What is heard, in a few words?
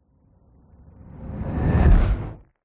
fantasy magic spell zap whoosh impact dark airy